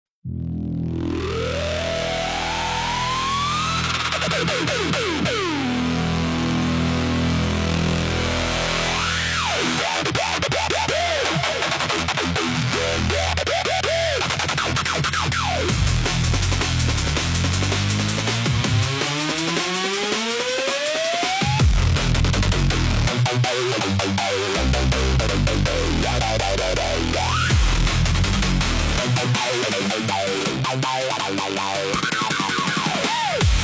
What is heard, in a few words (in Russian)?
ukrainskie narodnye pesni aggressive big beat remix